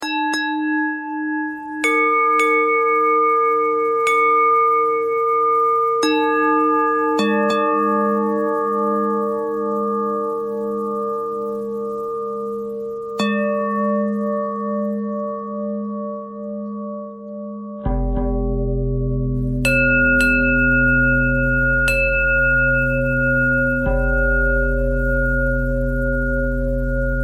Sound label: bell threads